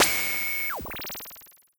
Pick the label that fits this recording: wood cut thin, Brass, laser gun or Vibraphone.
laser gun